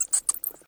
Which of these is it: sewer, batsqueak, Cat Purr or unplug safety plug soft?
batsqueak